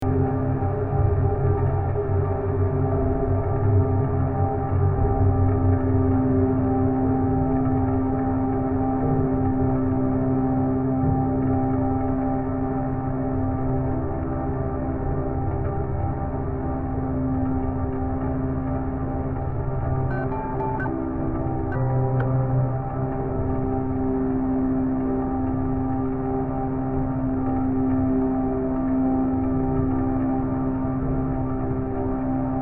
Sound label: amb